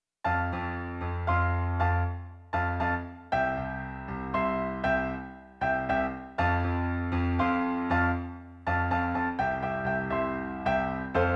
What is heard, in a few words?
Famous Singer Melody Prominent